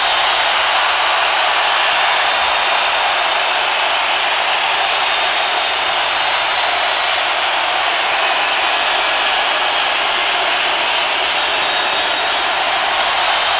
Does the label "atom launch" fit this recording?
No